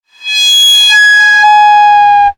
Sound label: sound design effect air pipe pressure; sound effects one